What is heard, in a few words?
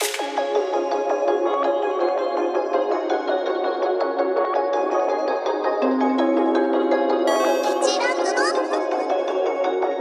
D♭M BPM165